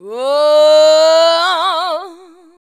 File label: "waauuu", shouts; Best Service; Hyper Dance